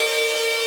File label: synth main